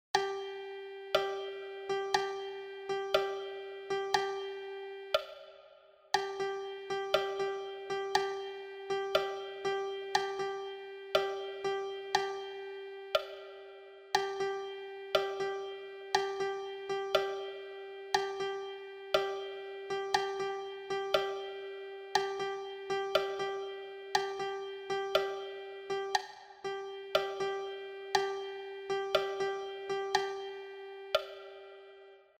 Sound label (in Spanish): percusion